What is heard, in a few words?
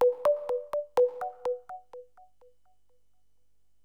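percussion, house, 125, drum; eJay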